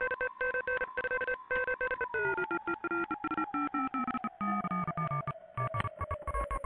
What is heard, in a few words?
morse down, filtered morsecode like